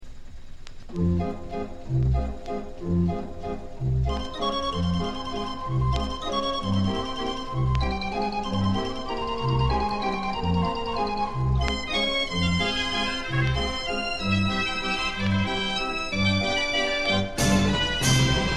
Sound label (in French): Orgue